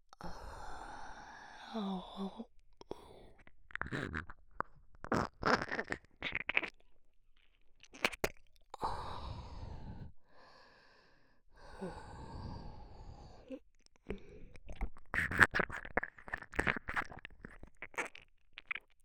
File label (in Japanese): 耳舐め ダウナー 右, ASMR; ボーイッシュなラバースーツ彼女に赤ちゃんにされて再スタートする人生